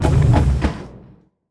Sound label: Elevator Open Close